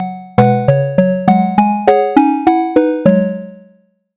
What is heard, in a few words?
music grid